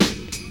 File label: sizzly snare sound g key, snare tuned to the G note. Loudest frequency: 2104Hz; snare drum samples